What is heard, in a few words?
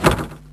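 Dungeon Treasure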